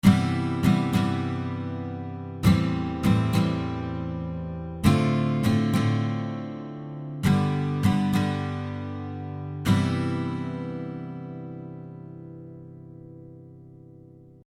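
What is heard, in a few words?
Guitar rhythm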